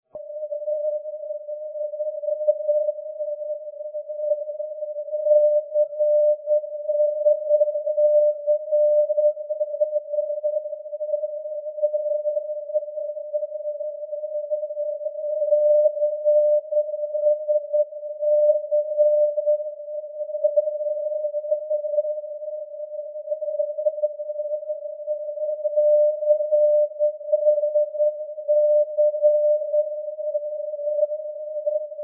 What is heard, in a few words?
ndb cannes mandelieu; europe